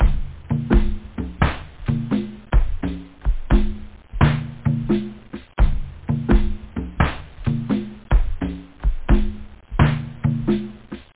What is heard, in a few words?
bongo